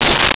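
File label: Pneumatic Flange, Science Fiction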